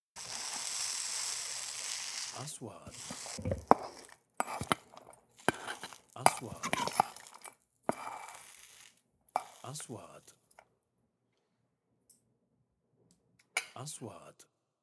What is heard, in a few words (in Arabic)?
صوت غليان حمس; sound effect